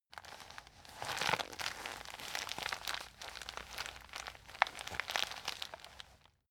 plastic bag handle long

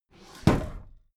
desk drawer close heavy

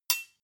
sword hit scrape squeak soft

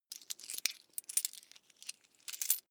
coins clatter soft

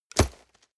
nylon leather hit hard

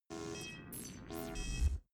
space searching computer

